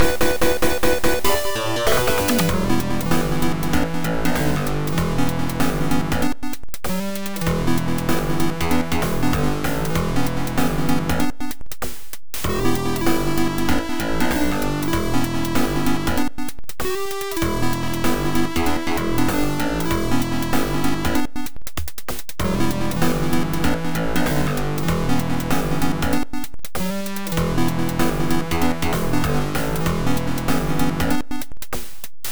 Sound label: Bounce; General Purpose 3